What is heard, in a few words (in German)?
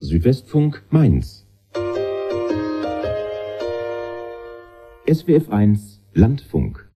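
landfunk, Radiojingles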